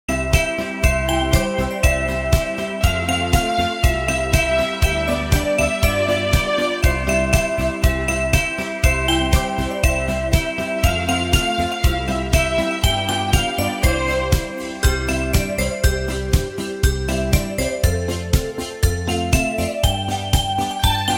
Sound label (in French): sonnerie noel jingle bells